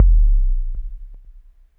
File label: kick, Hardcore Breakbeat; Big Fish Audio - Hardcore Breakbeat Action; Disconnected